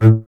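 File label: STR CBS, Solo Instruments, double bass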